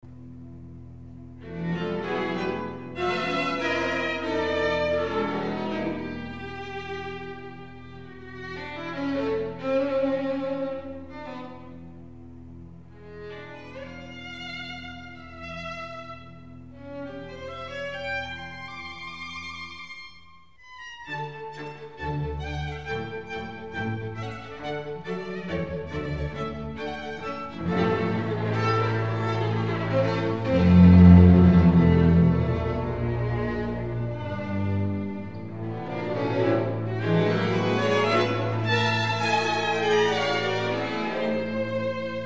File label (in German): musik streichorchester